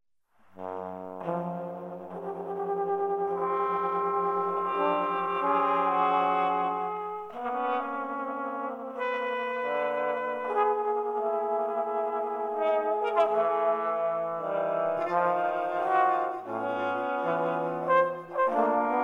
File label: piano and orchestra; stravinsky